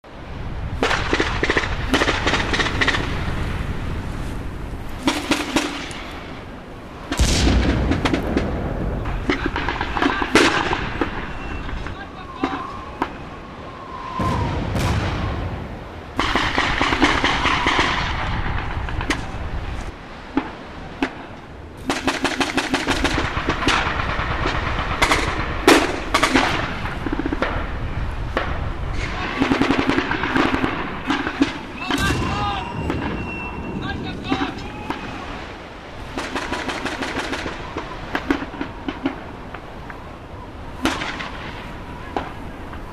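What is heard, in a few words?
battle ext, ambience stereo; mohaa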